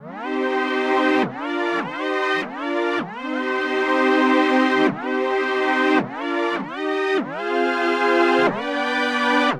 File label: String